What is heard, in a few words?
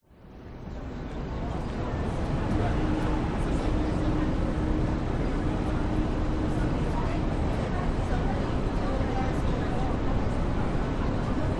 public places city square brisbane australia people city hum clock; king george; clock tower strike